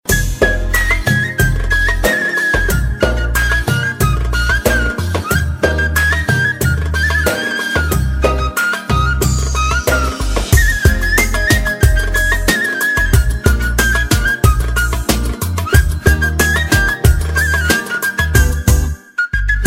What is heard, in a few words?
sax arab; classical